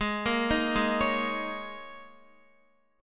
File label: Ab Suspended 2nd Suspended 4th Ab down Guitar